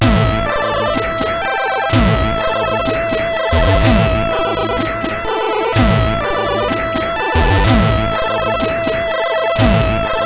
X-Music Remix, CSG8580

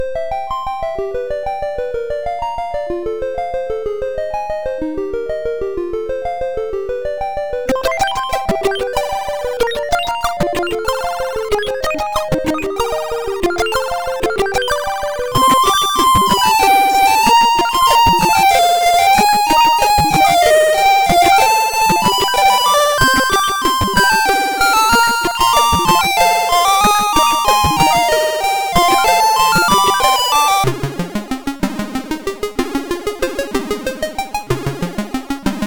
Medieval Tune sid MOS6581R4

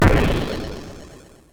SFX; Narco Police

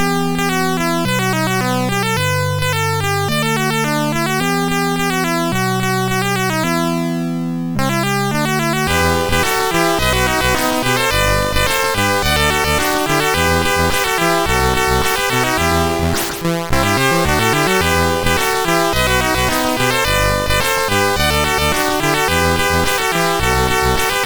Old Favourites, sid MOS6581R3

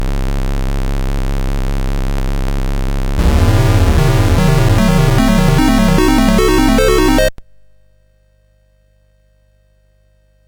Dragonworld sid CSG8580R5

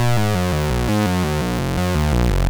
BASIC, MOS6581R3

